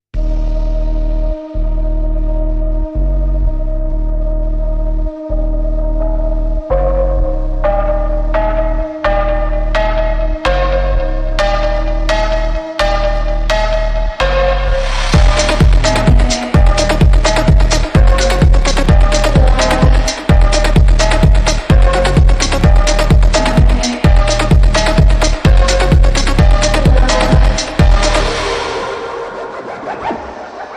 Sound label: Distorted